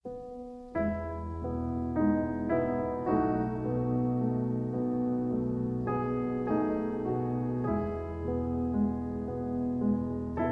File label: piano etude